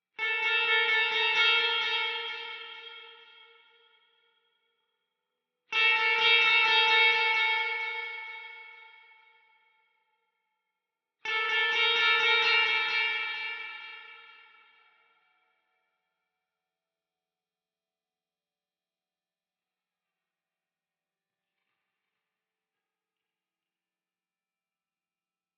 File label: Reverse Layer (Guitar); The Binding of Isaac Repentance; Genesis II Reverse Depths Layer (Guitar)